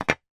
game plastic letter tile rack put down on board gently; scrabble